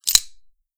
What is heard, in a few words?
slide pull, hk45